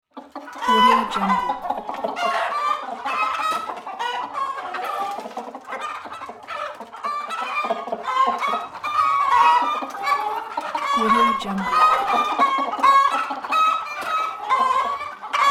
chicken farm

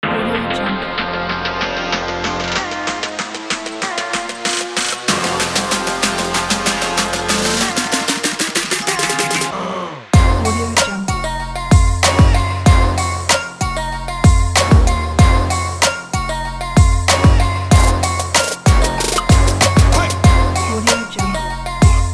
energetic sport trap

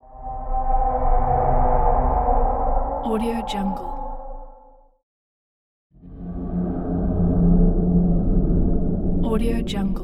horror transition

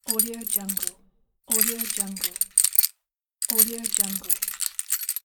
bunch of keys

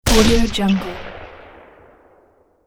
famas f1 single shot; sound-ideas

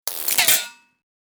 silenced uzi gunshots

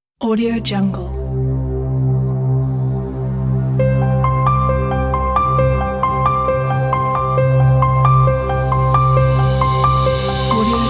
intro logo corporation; pandawa9

